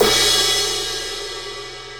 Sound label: 18 inch traditional jazz crash ride crash, cymbal; Bosphorus